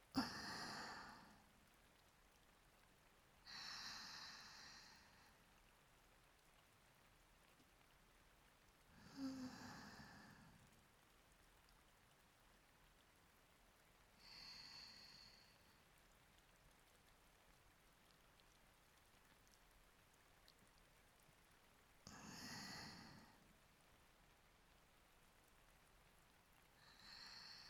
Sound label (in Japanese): 雨音と吐息